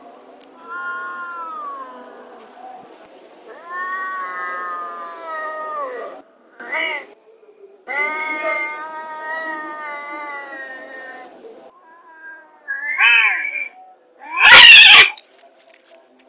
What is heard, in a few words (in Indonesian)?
Suara Kucing Kawing